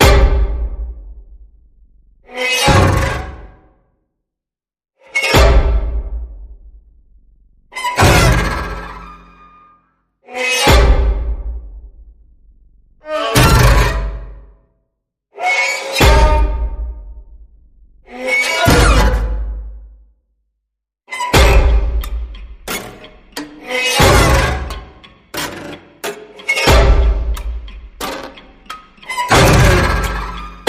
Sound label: Twisted Thriller Pendulum